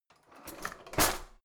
old drawer creaky out hard

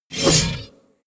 sickle slash

